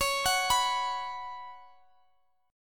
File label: Db 7th Suspended 4th Db down Guitar DADGAD